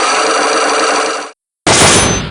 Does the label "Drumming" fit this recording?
No